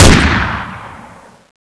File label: fire, weapons; tfa cso; crow3